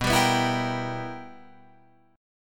B Major 7th Suspended 4th Sharp 5th Guitar Open F, chord; strummed; 11,14,11,11,13,14